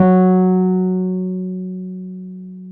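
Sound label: piano Wurly; Keys of the 60s and 70s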